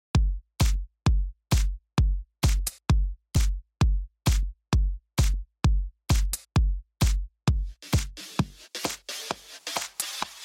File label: music dance techno beat